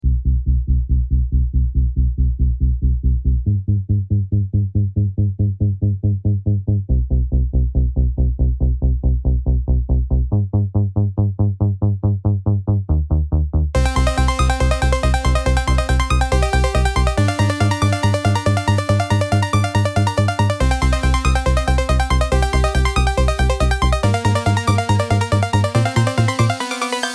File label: tension suspense; freepixel